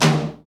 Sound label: TOM TOM